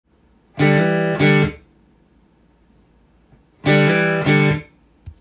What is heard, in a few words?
e blues; collection of short e blues phrases tab